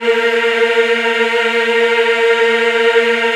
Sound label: A#3 MW EH -R, Choir Acoustic; USB Soundscan vol.28; AKAI